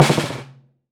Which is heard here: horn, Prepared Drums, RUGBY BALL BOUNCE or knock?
Prepared Drums